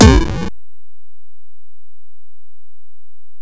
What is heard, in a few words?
8-bit kick 140bpm A#